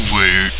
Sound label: woot